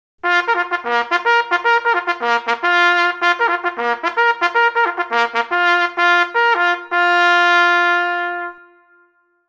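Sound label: signals - big assembly, horn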